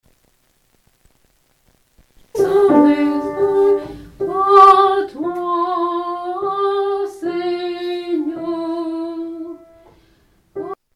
A Toi Alto

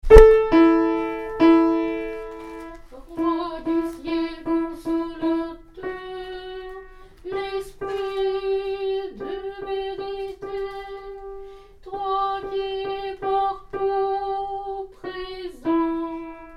Roi du Ciel alto

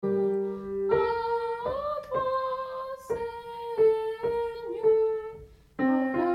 A Toi Seigneur Tenor

